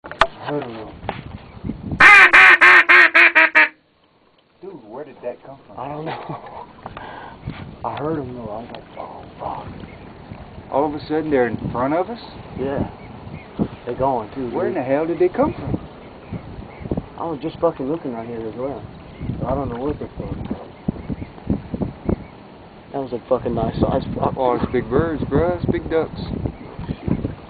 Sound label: lots Of Calls